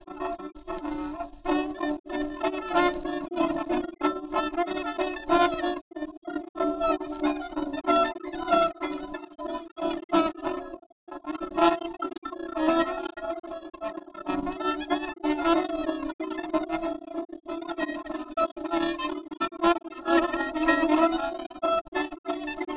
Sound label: Jazz